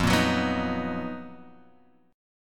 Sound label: B Major 7th F, chord; Bmaj7/F strummed; JGuitar